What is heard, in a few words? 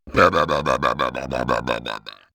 Burp